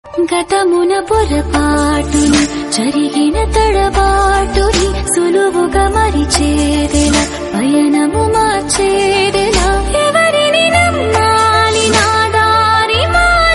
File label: song Female Version ringtone